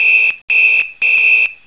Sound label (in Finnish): vihellys